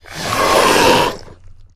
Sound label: Attack; Corridor Creeper